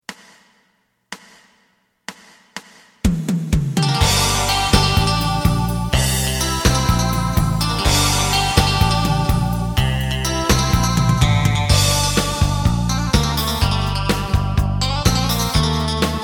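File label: side guitar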